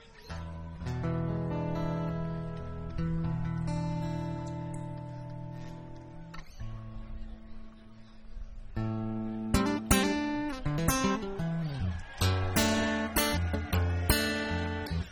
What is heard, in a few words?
jazz